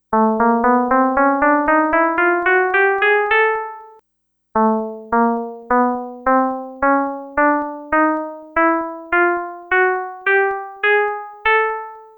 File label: Scale Equal Temp